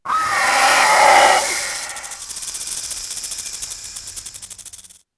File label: Dilo Hiss, Dino